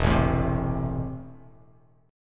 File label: E Suspended 4th Sharp 5th, down, Bass Standard; strummed; JGuitar